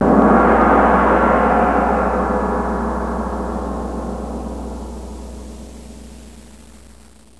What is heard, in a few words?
GONG; MUSIC; PERCUSSN